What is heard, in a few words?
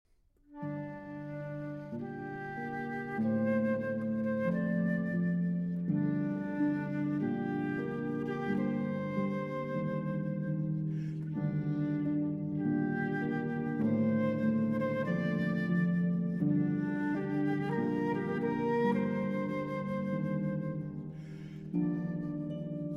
flute and harp; Rota Sonata; Andante sostenuto